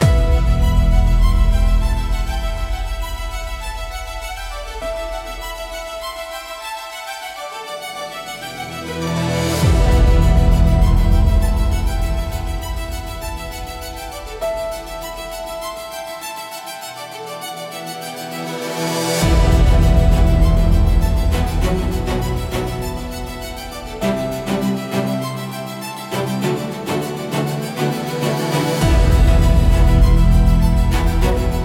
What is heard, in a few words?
skybreaker engine a cinematic electro power soundtrack; cinematic-energy; Cyber Ascension